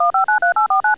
dialing; VentaFax

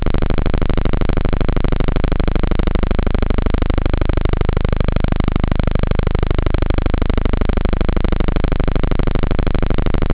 terror, synth bass

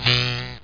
shield

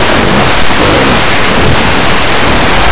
club

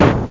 bang

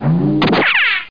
takeoff; How Many Bugs in a Box

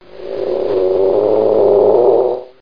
moan; Hexen II; mummy

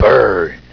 Fire Mage; IQ2 Demo

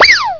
whizz; Windows Gamepack

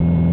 drone

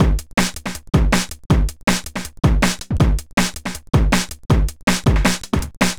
DRUMLOOP D+B 160, Drum+Bass Loop; eJay

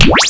shoot

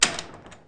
Latch

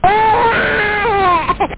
WAAH; columns

